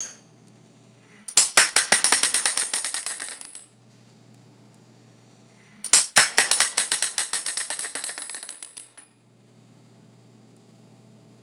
metal bullet cartridges falling and bouncing; bullets

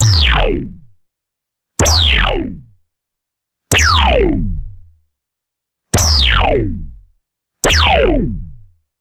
six retro sci fi phaser shots; lasers

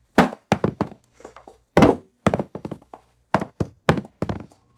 high heel shoes dropping on rug; foley

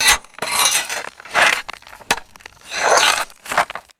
metal shovel scraping loose gravel

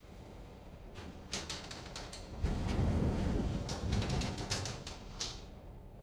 medium wind gust rattling a window

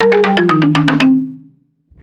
rapid descending wooden mallet scale; cartoon

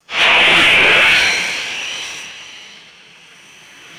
short pressurized gas purge and hiss; aircraft

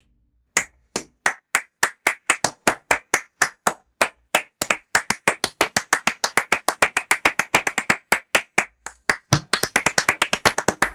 dry handclaps varying in speed and intensity; foley

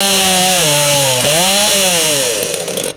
gas chainsaw engine revving quickly; tools

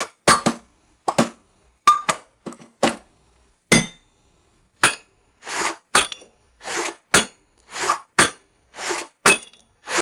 wet suction pops and glass clinking; food-drink

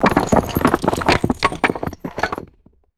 heavy brick debris dropping and clattering; rocks

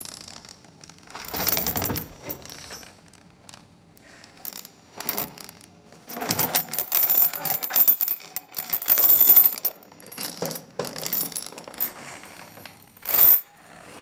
metal chain dragging across wood

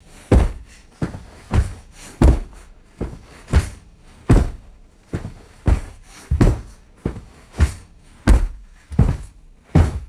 heavy body falling down reverberant stairs

